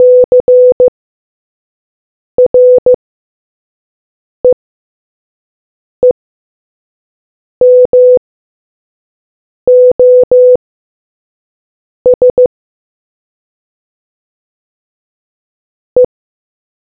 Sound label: morse